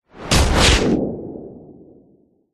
sound design hit whoosh into punchy crunch hit with a long tail